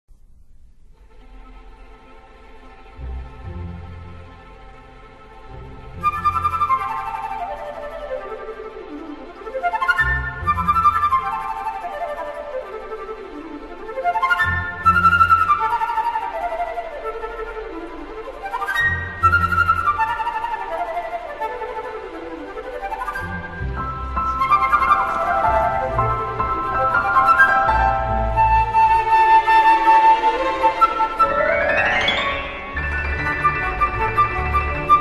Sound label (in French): Flute traversiere; Le carnaval des animaux La voliere Camille Saint-Saens